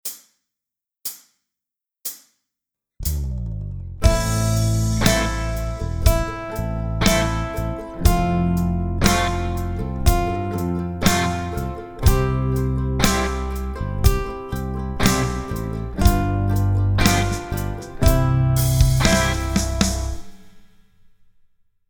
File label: Funky